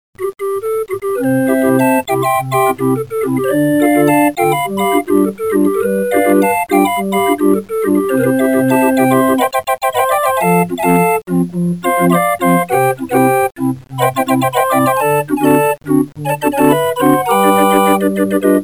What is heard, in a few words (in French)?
Orgue